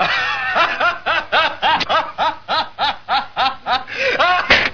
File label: laught